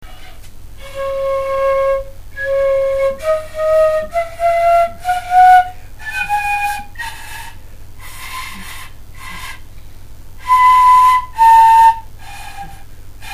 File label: flute pvc